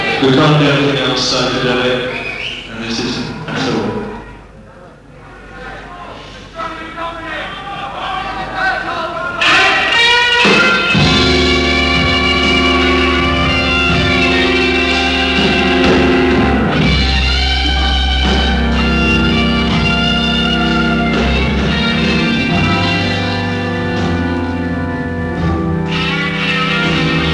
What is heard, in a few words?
Blues